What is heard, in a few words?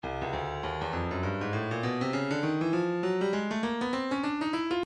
breakbeat 100 bpm; bleach d; Stylus Breakbeat Midi Files